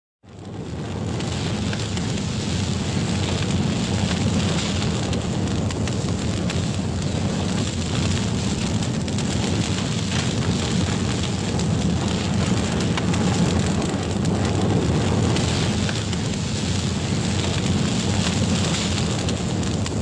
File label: cracking